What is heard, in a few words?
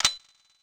Raygun Empty; Super Smash Bros